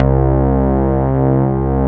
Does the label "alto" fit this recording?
No